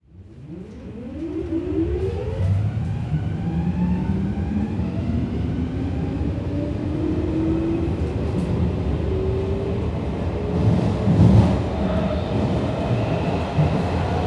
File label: metro ride departing interior; transportation